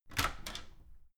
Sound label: open door handle